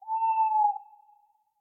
Tawny Owl; SyrinScape

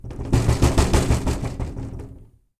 Rattling Window; SyrinScape; City gone mad

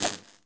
timebomb wire cut; Papers Please

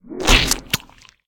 deep ooze slam; SyrinScape; East Ward

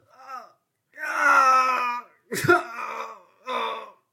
Male Pain; SyrinScape; City gone mad

